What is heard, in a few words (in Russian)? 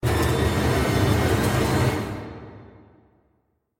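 страшный звук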